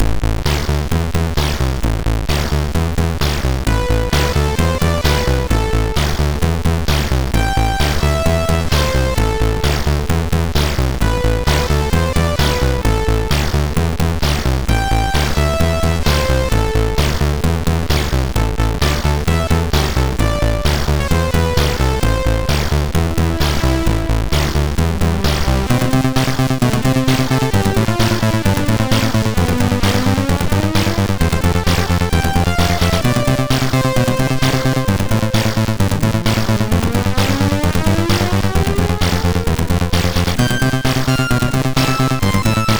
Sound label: Dance Sample, MOS6581R2